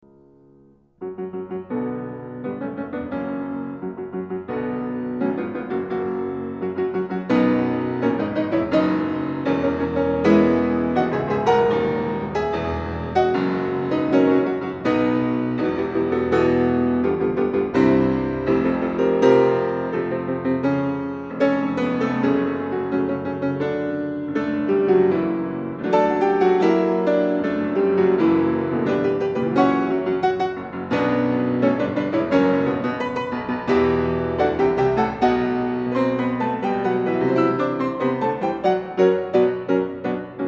lush steinway

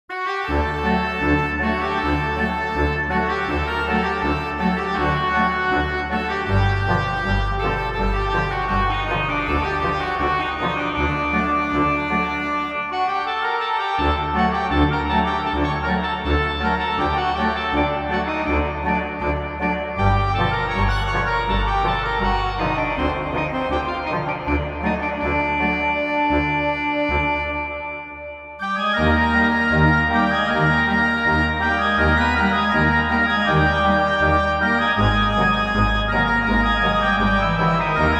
Egyptian Organteq; Some of my most crazy/fun recordings experiments Orgtq Ptq; Pianoteq

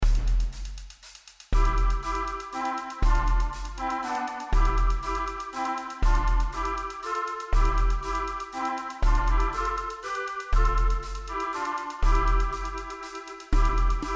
synth

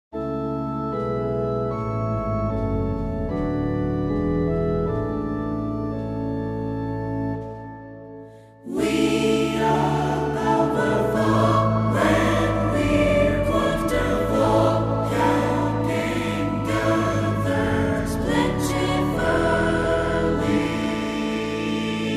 Choral Organ

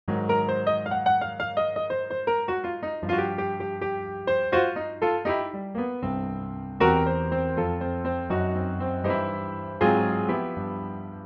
Blues Upright

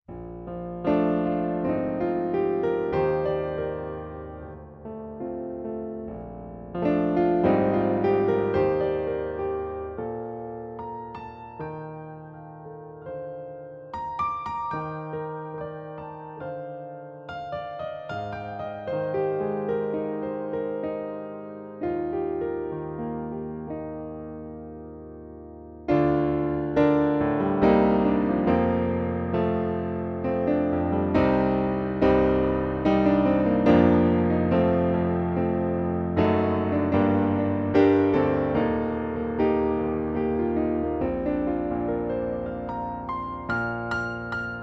Baldwin Grand

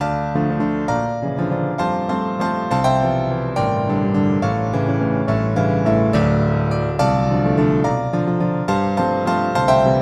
Powerful; Pirate

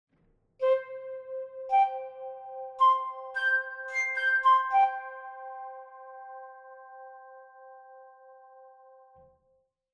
flute, Frenzel; FX; Kremsegg2; J Frenzel

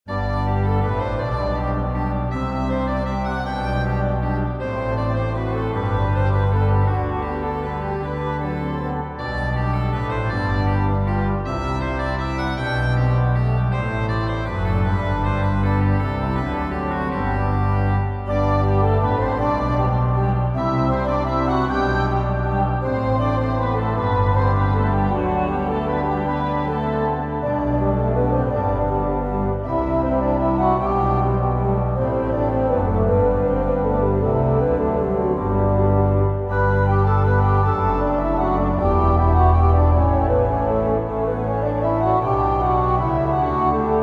Menuett G Major, Organteq

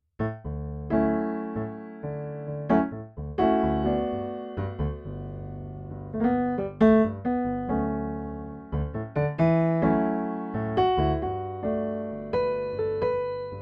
Nice Piano

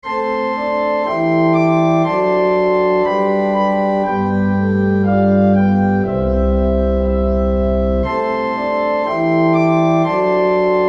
organ; Google

